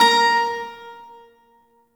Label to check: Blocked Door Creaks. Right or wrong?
Wrong